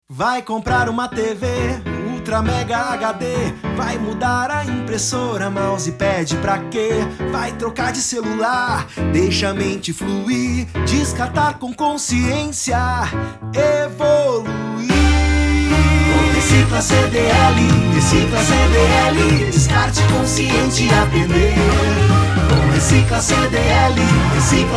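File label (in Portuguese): JINGLE; RECICLACDL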